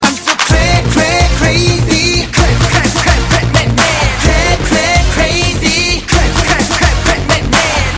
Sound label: Clap